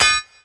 anvl; BLOOD2; Ancient

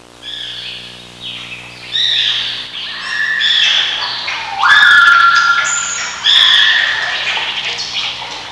jungle in; Sound Library 2000; Wayzata Technology; Music In

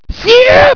SIP

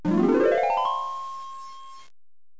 harp; Know Your Stuff - Astronomy

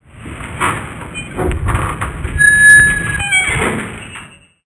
Door Open

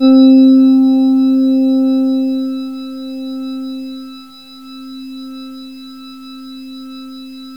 bowed glass, synth pad

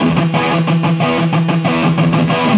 Guitars; ACID Music

